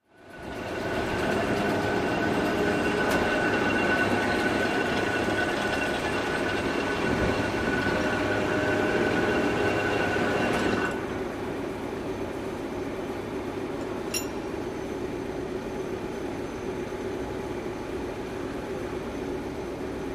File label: Industrial Machine Me, factory